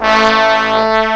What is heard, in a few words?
HORN; BRASS1